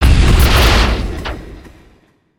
Spider Boss Ground Slam; creatures mechanical

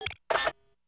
Broom Bot Sound, creatures mechanical

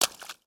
Foot Swamp Water Run; footsteps

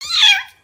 Kitten Meow; creatures organic

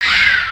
VO sleep, creatures organic

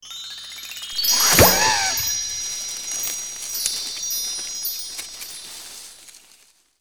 Pet Celebration; creatures pets

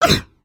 VO Walk Run; creatures organic

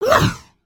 Run, creatures organic

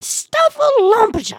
excited, emotes

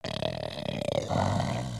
VO sleep, creatures organic

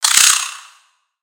Spider Get Hit; Lego; aronwk collection; ndaudio; creatures mechanical

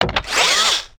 leg servo, creatures mechanical

